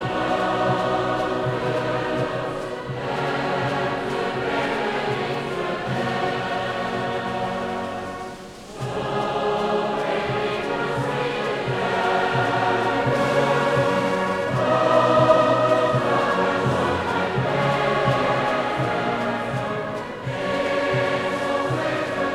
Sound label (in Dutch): Koor gemengd; De muziekale fruitmand